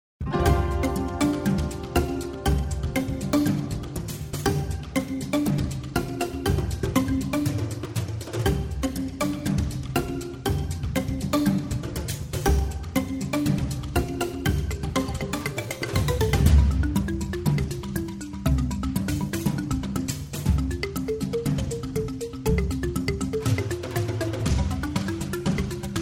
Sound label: bamboo